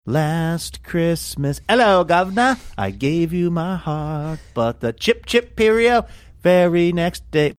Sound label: singing ello guvner